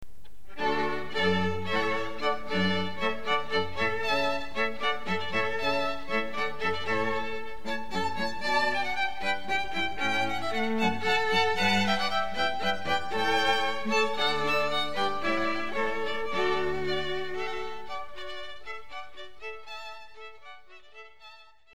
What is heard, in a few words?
Hornpipe Water Suite; Handel